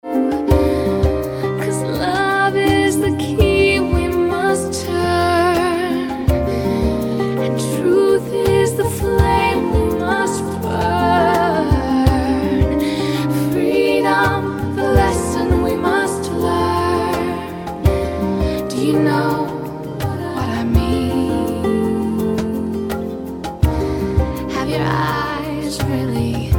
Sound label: Love Song